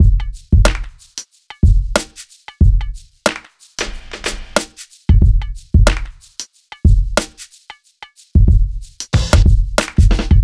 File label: Urban Beat, Drums